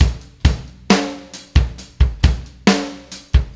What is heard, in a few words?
Rock Beat